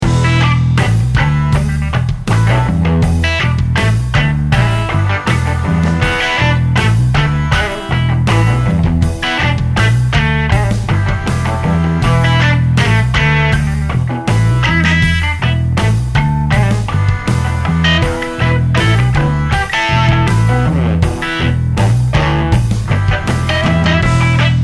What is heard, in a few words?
Lead